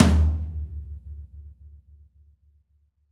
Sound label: Pop Tom, Roland BK-5